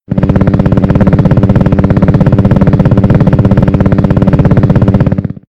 Propeller; Doodle Jump; 3DS, Android, Arcade, DS, iOS, Mobile, Xbox 360

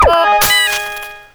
Boss Hit

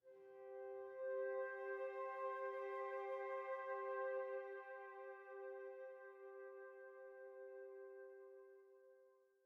Env Field Night Ground

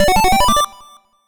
cameo fanfare

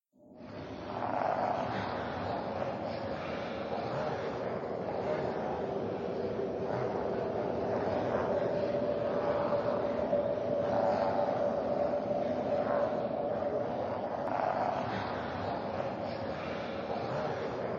Zombie Ambience; Zombies Ate My Friends; Android

